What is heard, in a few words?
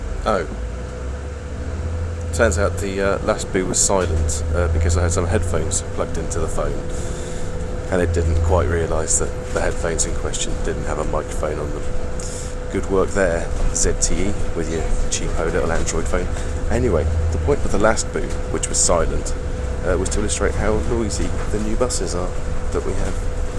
actual noisy bus